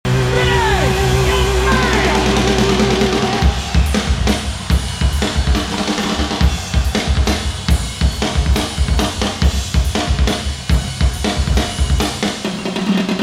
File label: Drum Solo, Live; Donington UK